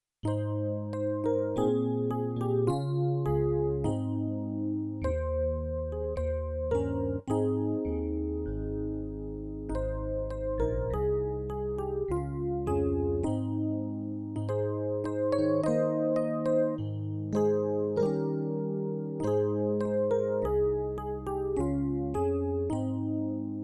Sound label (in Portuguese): playback hino